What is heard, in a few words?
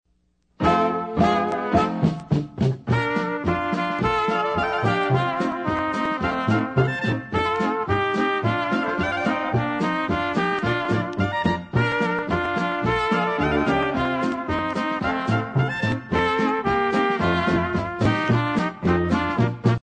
OLD CZECH MUSIC POLKA